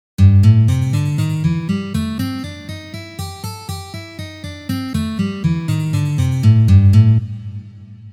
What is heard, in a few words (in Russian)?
Blyuzovye gammy Lya minor